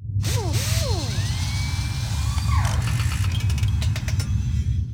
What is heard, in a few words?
propellers repair; activities planetoid